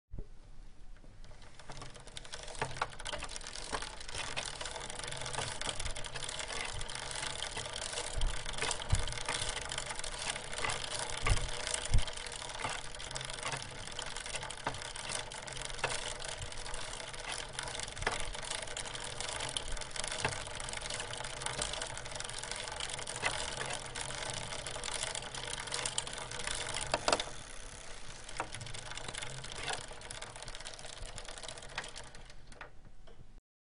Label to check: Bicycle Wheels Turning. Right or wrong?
Right